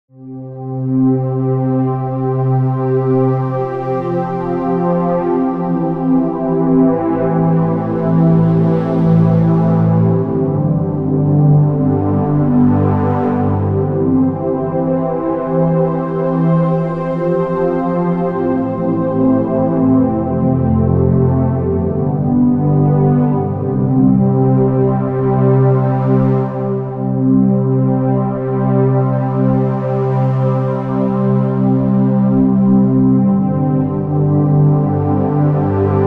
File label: Underscore PAD; Worship Flow